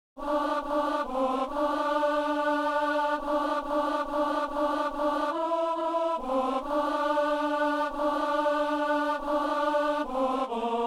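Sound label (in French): ténor; messe coutrillonne; agnus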